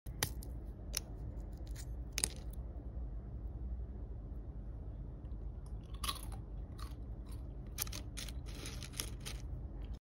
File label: Open pistachios